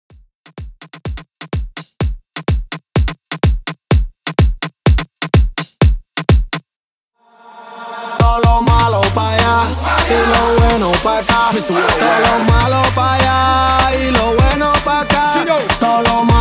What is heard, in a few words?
Intro 126Bpm Dirty